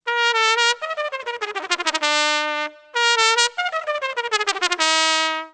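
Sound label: cornet fx; Musical Instruments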